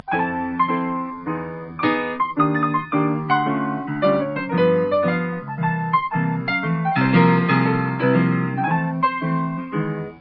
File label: Cool walk, piano boogie